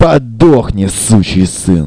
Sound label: LN SOB; REDNECK; RAMPAGER